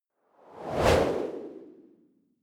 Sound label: whoosh simple hard long